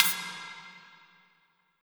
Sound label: perc, Drum & Bass, 170 BPM